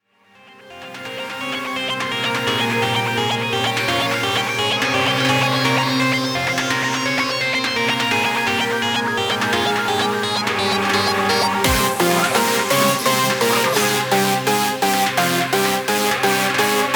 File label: dark tenor